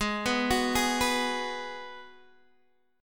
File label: G sharp Minor 7th G sharp, Guitar Open D